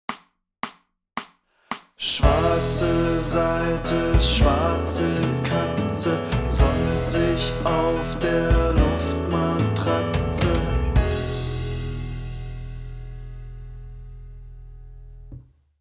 Schwarze Saite; die bunte gitarrenschule band 1

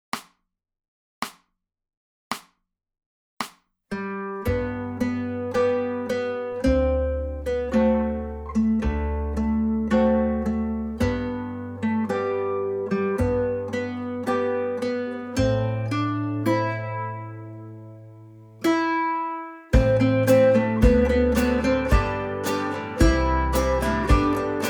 Das Osterlied Melodie; die bunte gitarrenschule band 2

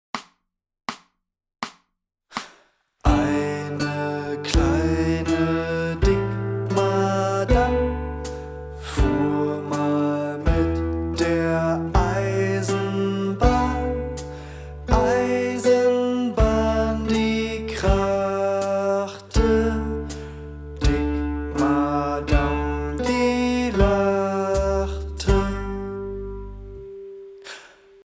Eine kleine Dickmadam Bass; die bunte gitarrenschule band 1

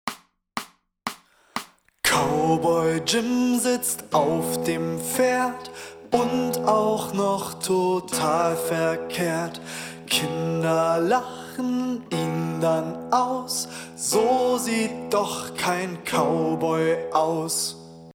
Bass; Titel 11 – Cowboy Jim (Bass)

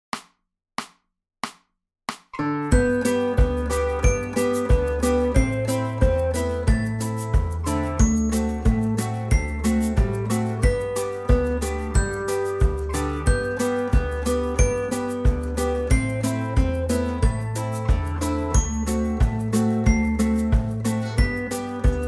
Herbstlied Melodie

